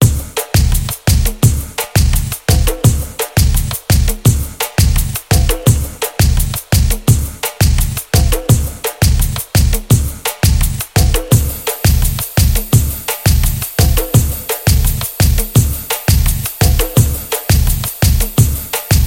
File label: iron dub